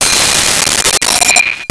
Bell, Metal

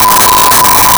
censor beep

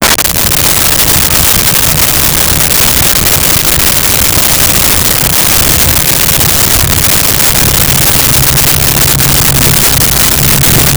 Boat Motor Various Speeds; Vehicles; Watercraft; SONY PICTURES SOUND EFFECTS SERIES

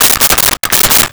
Staple Gun; Tools; 1001 Sound Effects

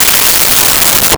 UFO Sweep; Transitional Elements; 1001 Sound Effects

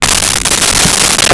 Handle Microphone Stand

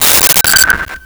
Glasses Hit and Ring; Crashes; 1001 Sound Effects

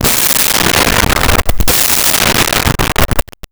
Machine Gun Shots; Weapons & Explosions

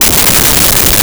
Low Sci Fi Rumble Loop

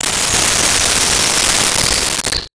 Space Age Motor Cycle, Science Fiction

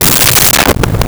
Dull Wood Hit Bounce; Vintage Cartoon; Drums & Percussion

